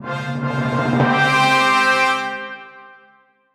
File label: King Fanfare Short; super crazy rhythm castle